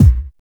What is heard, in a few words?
smooth kick sound b key, kickdrum sample tuned to the B note. Loudest frequency: 202Hz